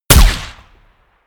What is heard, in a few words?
gun shot silencer designed ricochet